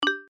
lock button; ThreeHeadedDragon

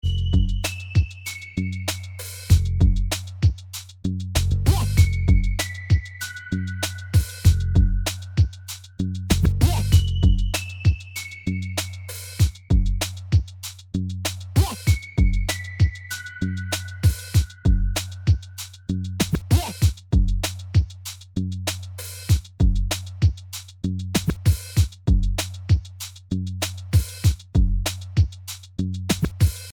arp

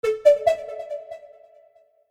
notice

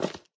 block coal destroy

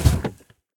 quick Stop; jumanji

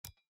ui screen error; everdawn

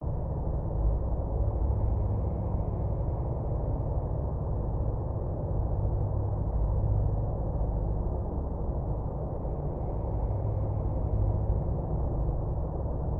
wind

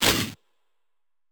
damage, building

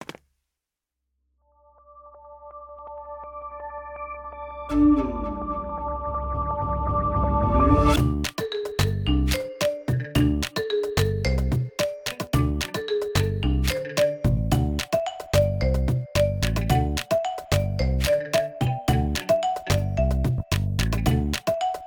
intro buildup menu

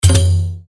miss Slot

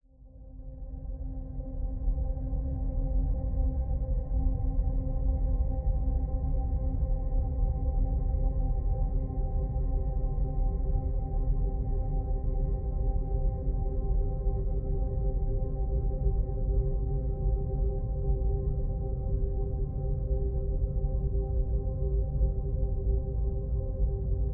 song

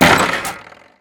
cage break

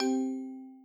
reward

